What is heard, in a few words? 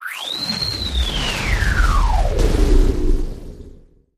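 Air Reverse Arcing Whoosh, stinger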